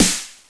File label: soft hit clap